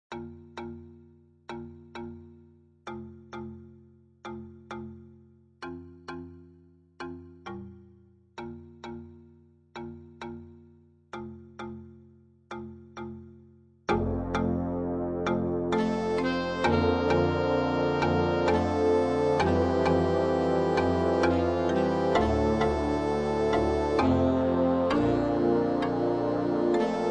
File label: marimba; Marimba Concerto, Mvt. 2